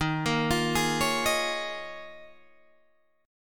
D sharp 7th Suspended 2nd Suspended 4th, Guitar, Open G